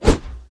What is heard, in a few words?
swing, monster; thiefboss1